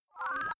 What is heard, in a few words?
interface beep